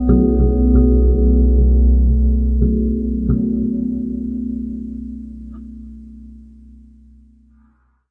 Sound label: deep and wonderful bass loop; LOOPING AMBIENCE